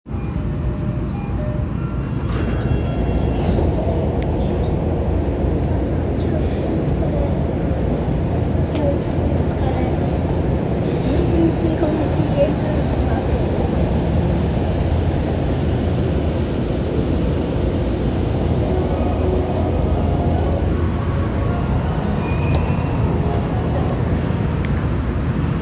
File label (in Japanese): 日比谷線恵比寿北